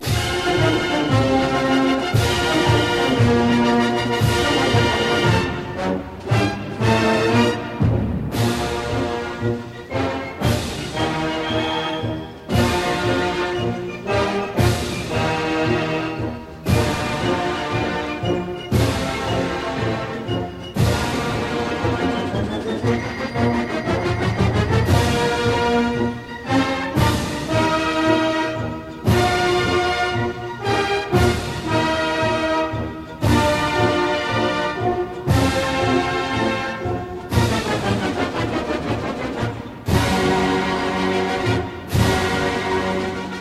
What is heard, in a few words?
Band - March Grandioso